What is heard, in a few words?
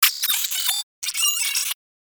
space drone pain; Enemies; Warframe; Corpus Space Drone